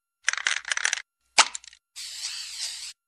Spray; San Andreas

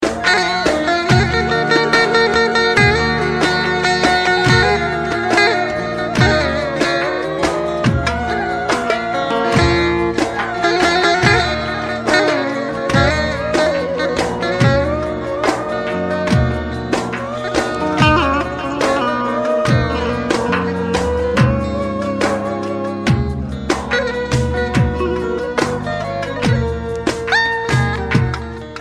Elektro Baglama

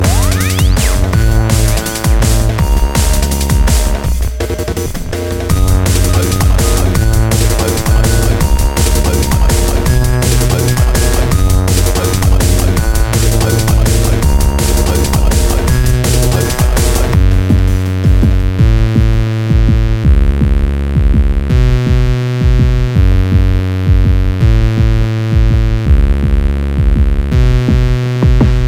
Dnb; Memorikado

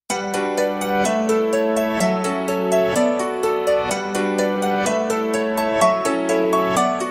Melody

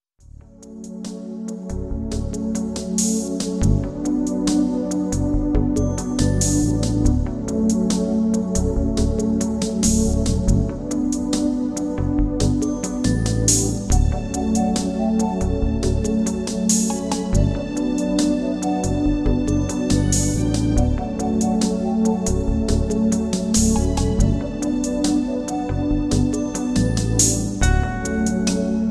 Relaxing Alarm; Sky

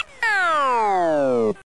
Close Down

